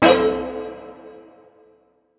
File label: Button Chapter Confirm; helltaker